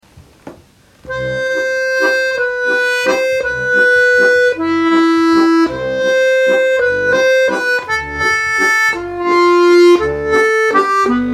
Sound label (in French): Musette; Steirische Harmonika; 4-reihige Steirische Harmonika